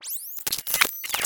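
nanotech complex short reversed robotic robot cyber tech nano micro reversed movement; fvtt sonniss gdc audio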